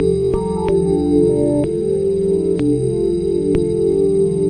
bing bing atmosphere; SYNTH AND ELECTRONIC LOOPS

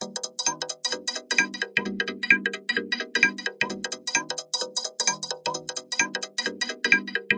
130 bpm, vocode swing drums

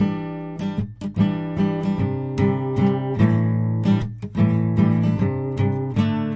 guitar part; guitar loops; coal mine

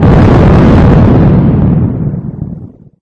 explode, video games; sound effects and noises

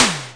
Simmons SDS8 sd15; drum machines

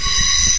subway sound; Subway Sound Construction Kit

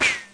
shot, video games; targ

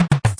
Syn Dr, Overloaded Synthetic Drums; style kits

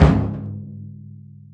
arabic perc, Arabic Percussion Kit

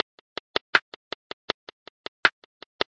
080 bpm metro echo, drum loops and breaks

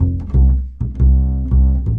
upright bass groove; BASS LOOPS

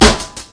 dirty hip hop SN; drums full kits; style kits

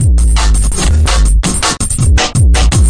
166 phat wet n fuked, drum loops and breaks; 161 to 180 bpm

